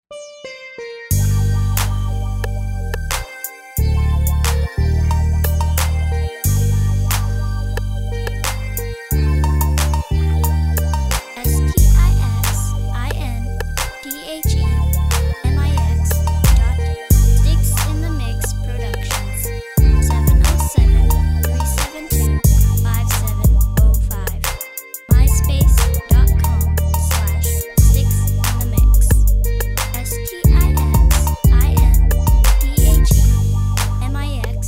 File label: block cruisin, beat